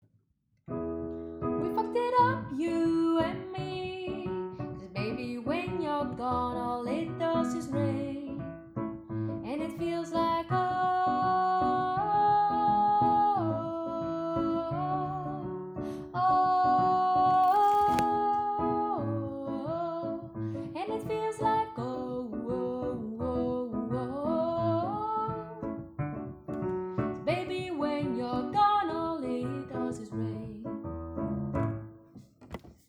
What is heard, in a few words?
S2 Rain